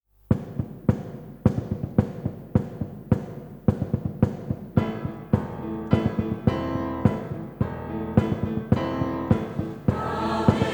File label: Gaudete, Christmas